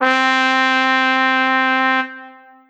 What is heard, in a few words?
Trumpet Note C4